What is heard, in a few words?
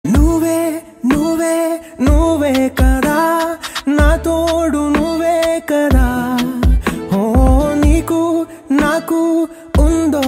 Love Ringtone